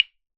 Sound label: Chinese Temple Block; Percussion Samples